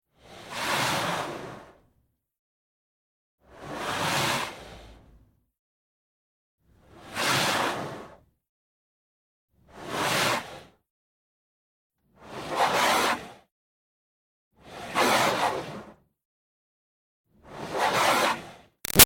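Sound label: Water Spray Interior Car By Soft Med; Car Wash; BigRoomSound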